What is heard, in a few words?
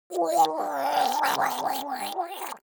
creature monster die gurgling high